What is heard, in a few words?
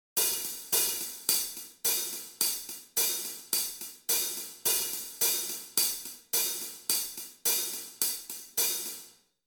High Hats